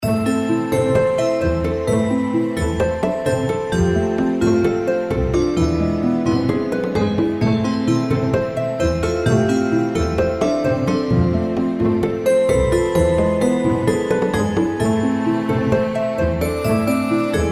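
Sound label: reel spin background; Rarities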